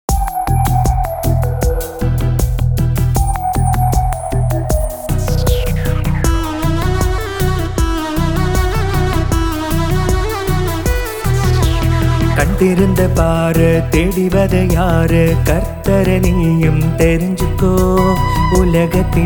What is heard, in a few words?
TAMIL SONG